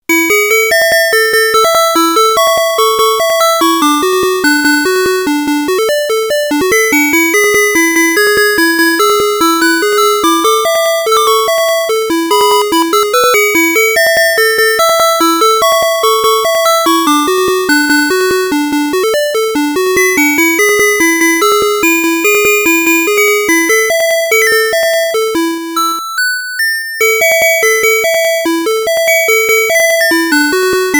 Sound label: Chord B